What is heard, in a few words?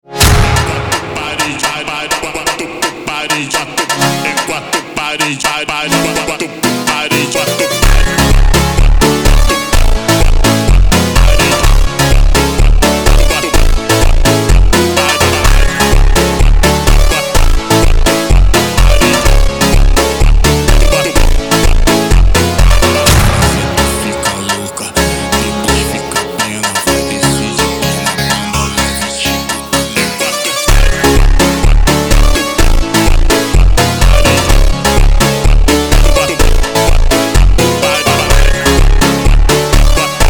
dark aura funk